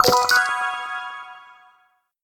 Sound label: magic Press; MQ2